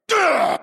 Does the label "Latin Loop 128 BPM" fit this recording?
No